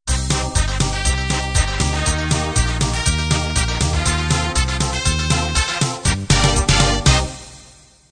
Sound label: 2beat 60-70s end